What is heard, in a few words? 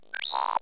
Creatuer